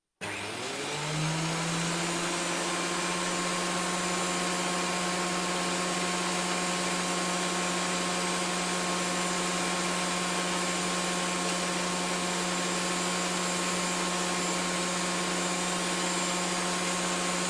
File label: carpenter tool table planer machine planing wood; sonniss gdc